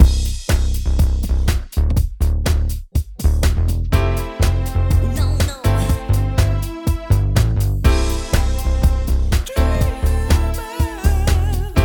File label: Instrumental